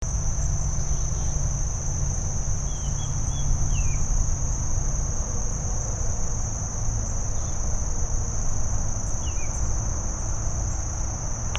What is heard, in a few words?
baltimore oriole